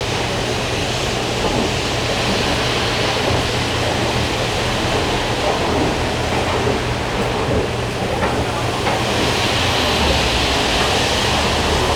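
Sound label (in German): U-Bahn Rathaus Neukölln, fieldrecording; U-Bahn Rathaus Neuoelln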